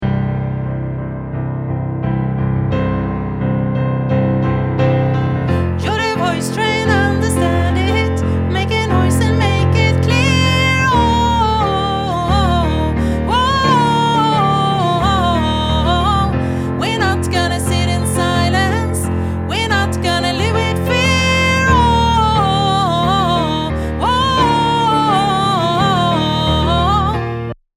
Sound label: SOPRAN